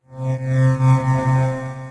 Sweepy Strings; Granulator III; Ableton; Factory Packs

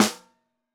SN1 Bottom, snare

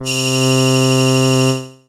P-50 Linear, P-50 Partials, Retro Synths; P-50 Structures; Chill Factor

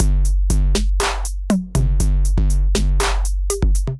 808 Kit, Drum Machines; 808 Depth Charger Kit; Ableton; Factory Packs; Drum Essentials

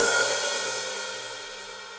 Hihat Open Dark Plate Verb; Drum Hits; Standard Hits; Ableton; Factory Packs; Session Drums Club

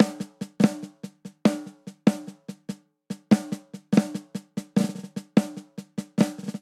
Heavy Rock Buildup 145 bpm, Drum; MIDI Clips; Ableton; Factory Packs; Drum Booth

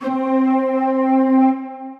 Glideform, Retro Synths; Ableton; P-50 Linear; P-50 Structures

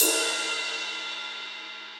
Ride Edge, drums; Drum Hits; Big Punchy; Standard Hits; Ableton; Factory Packs; Session Drums Club

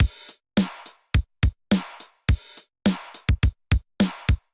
707 Kit groove 105 bpm; MIDI Clips; Cannibals; Ableton; Drum Essentials

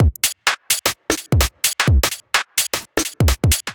Kit 128 bpm, Drums Basic; MIDI Clips; Blowin Kit; Ableton; Factory Packs; Skitter and Step

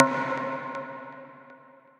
Talaperc, percussive; Ableton; Factory Packs; Punch and Tilt

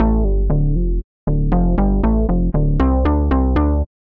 Bass (Dm) 110bpm; Music Loops; Keys Of Life; Ableton; Singularities

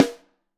Snare Top; Ableton Foundation FreePacks; Snare Designer

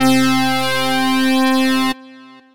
Lead, Synth Lead; Duned Out Lead; Ableton; Factory Packs; Chop and Swing

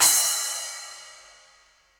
Splash 10 Ambient Loud Punch, Cymbal; Drum Hits; Standard Hits; Session Drums Studio

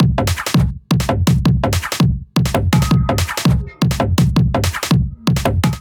Kit 165 bpm; MIDI Clips; Drums; Mad Mollusc Kit; Ableton; Factory Packs; Skitter and Step

